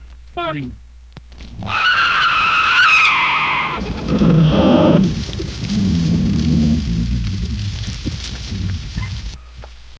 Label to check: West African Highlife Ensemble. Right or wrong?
Wrong